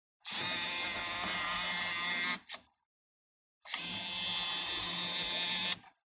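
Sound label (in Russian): zoom kamery, camera zoom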